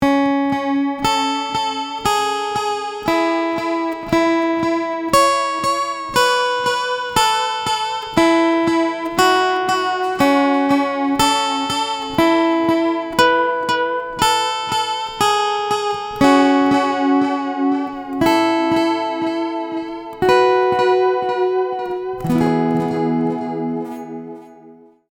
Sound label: Zoom A3 delay mod